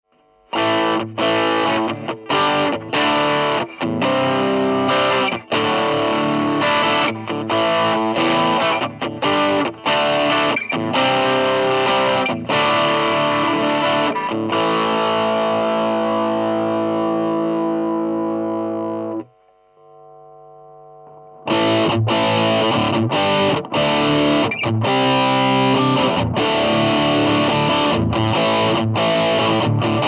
drive distr

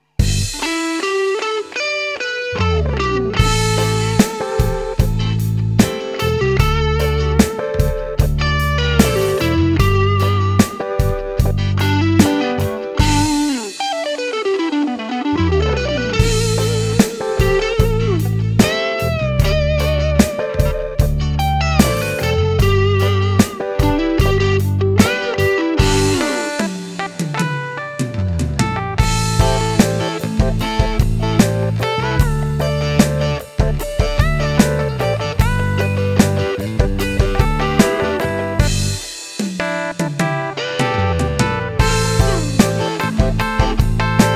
squier standard song

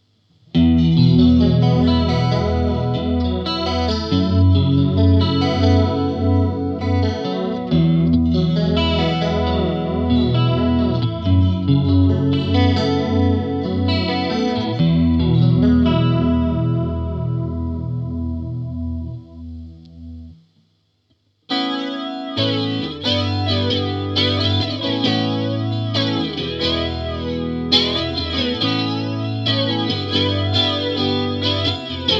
nux frontline 15 chorus and flanger

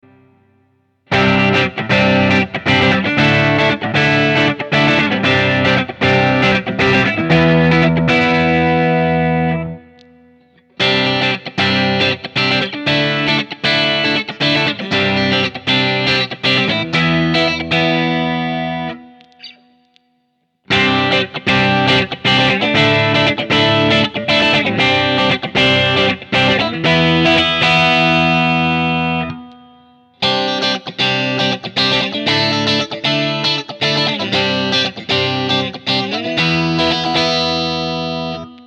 clean rithm

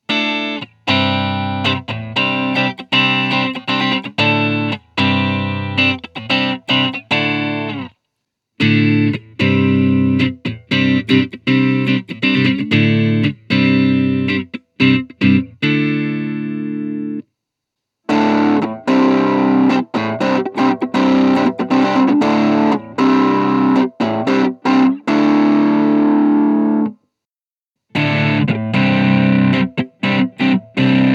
amps

